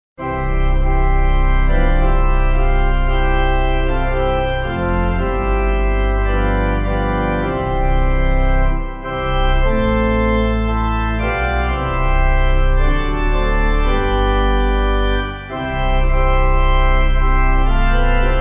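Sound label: Pipe Organ; O Thou In Whose Presence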